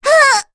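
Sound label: Vox Damage, Korean; heroes; Cecilia; kingsraid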